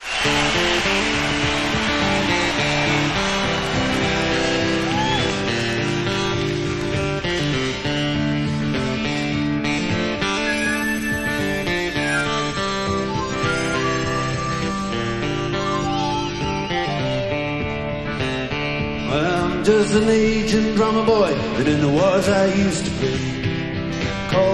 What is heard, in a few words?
Ovation Adamas; Wembley 85